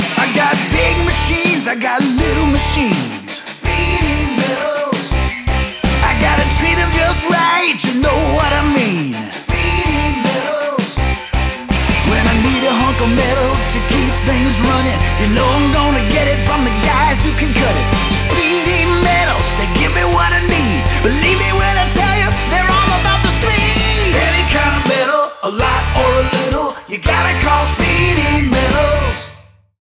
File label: Jingle; Speedy 2015